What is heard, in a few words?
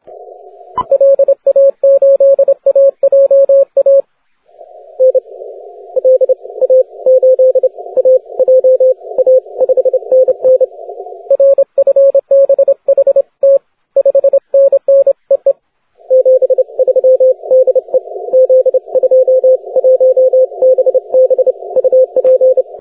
10m cw